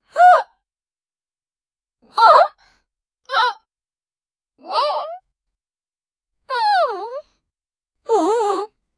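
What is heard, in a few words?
distinct short, reactions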